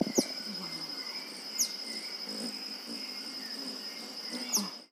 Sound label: Frilled coquette